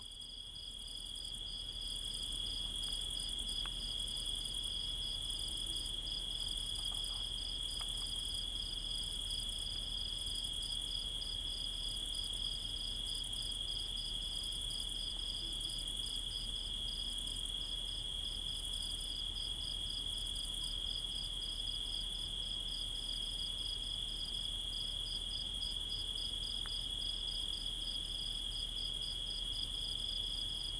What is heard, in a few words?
Crickets In Sardinia